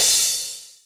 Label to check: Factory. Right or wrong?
Wrong